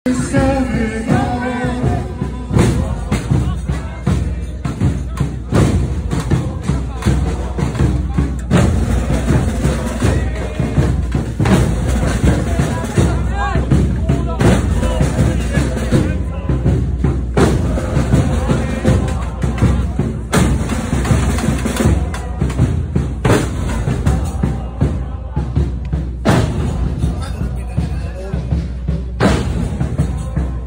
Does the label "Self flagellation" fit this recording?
Yes